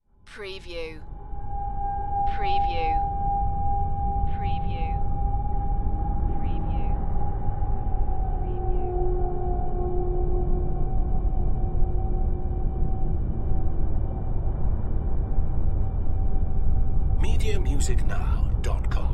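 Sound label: Production Element Bed Metallic; Sound Effects Bible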